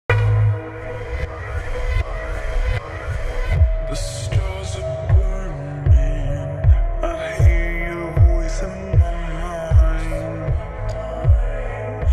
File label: slow reverb ringtone